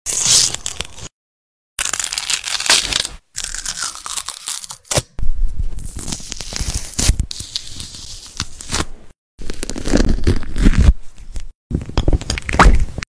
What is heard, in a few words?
Peel